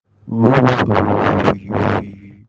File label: clap